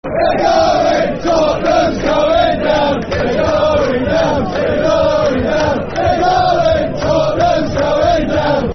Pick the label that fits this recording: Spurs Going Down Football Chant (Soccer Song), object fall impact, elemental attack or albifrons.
Spurs Going Down Football Chant (Soccer Song)